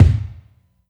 long kick sample G sharp key, bass drum sound tuned to the G# note